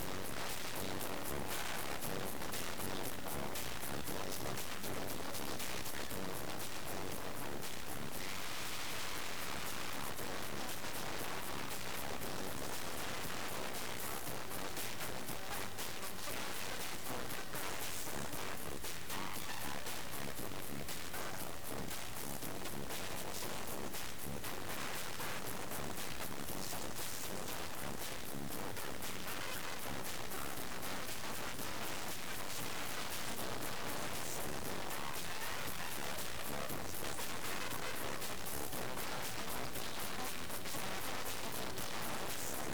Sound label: in ear mic alone with transmitter